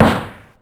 Snare, Ensoniq ASR-X Pro

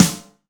F Stand Snare, Fusion Kit; Akai MPC500

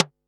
snaredrum, Pearl SC-40

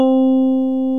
DIGITAL SYNTH; OMI - Universe of Sounds

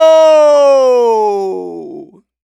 Native Vocals, Native American

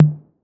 TOMS 808; Wizoo - Platinum 24 Electronic; CHR TOMS 808

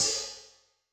Hvy Ft Sp, HiHat, Yamaha DTXpress-II

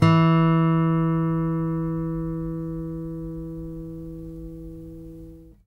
ACSMEDPICK, Guitar; Quantum Leap; Guitar & Bass

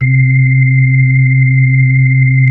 B-3, Hollow

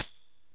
tambourine hit

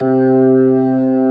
B3 C 3-3, ORGAN

